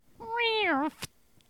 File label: Reewrffft